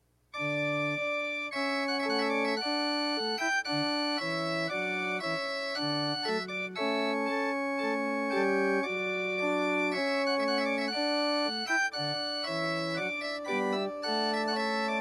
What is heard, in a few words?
Trumpet Voluntary